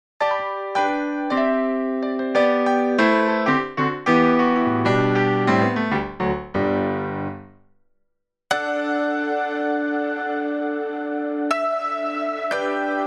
EG Montage Tine EP

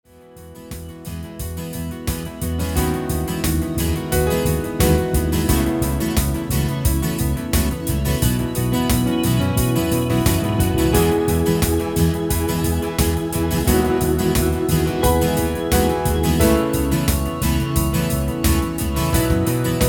M3 Rock Mix; Organimation

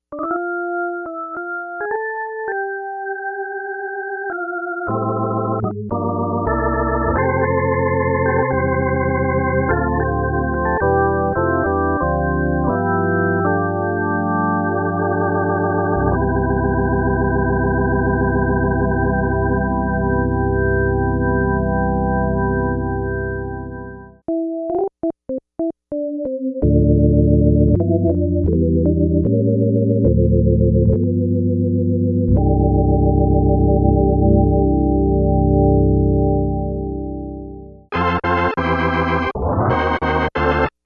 Rotary medley

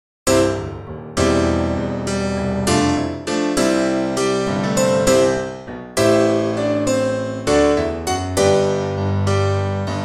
Signature Piano Kontakt Bright Gospel

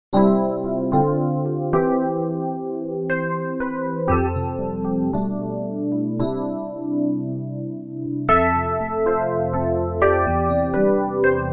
FM EP 8 Vel

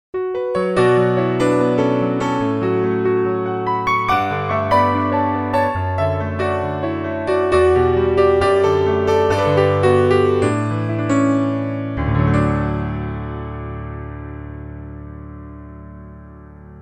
Piano FM EP

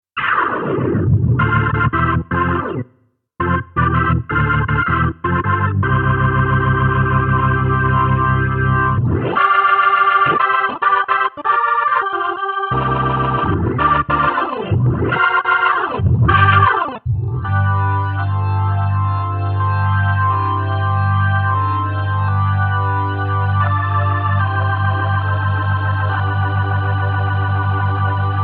Kronos OASYS Rock; Organimation

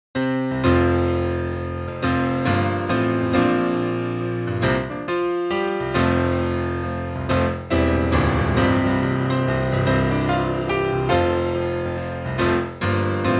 Piano bright

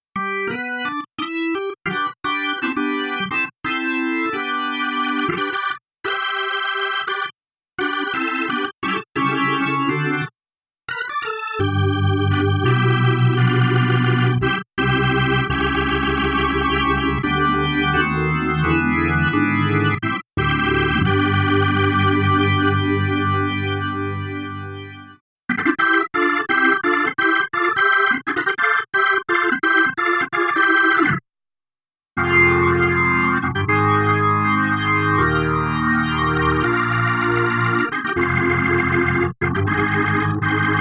Org Kronos Solo

